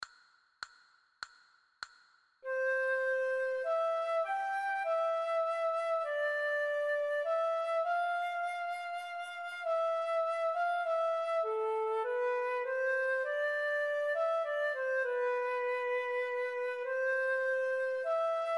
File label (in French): Petite valse, flute a bec